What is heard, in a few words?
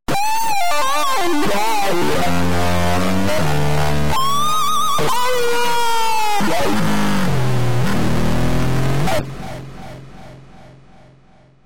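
Electric Guitar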